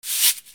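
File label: percussion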